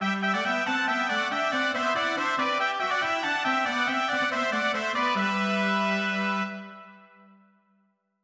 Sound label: JINGLE Battle Start; Monster Girls and the Mysterious Adventure 2; windows; ZephyrStudio